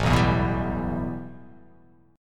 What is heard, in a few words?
A 7th Suspended 2nd